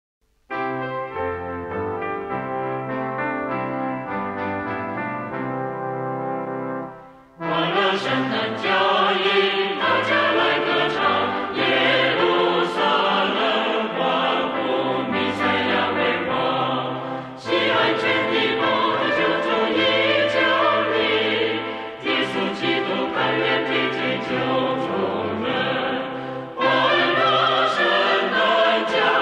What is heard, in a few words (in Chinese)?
欢乐佳音歌